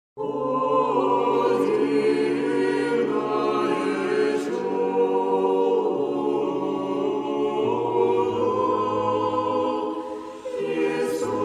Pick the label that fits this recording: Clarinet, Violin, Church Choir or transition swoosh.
Church Choir